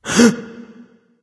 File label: breath; smite; voicepack; rama